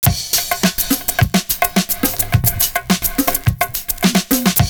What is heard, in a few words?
BEAT, loop; Univers Sons - Kitch 70s